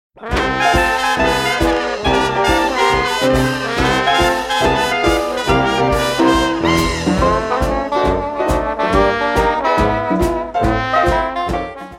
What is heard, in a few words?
Jazz Trad